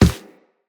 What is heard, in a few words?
Run Default, Footsteps; Characters; Operator